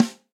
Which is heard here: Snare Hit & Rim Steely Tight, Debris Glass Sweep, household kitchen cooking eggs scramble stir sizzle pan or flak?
Snare Hit & Rim Steely Tight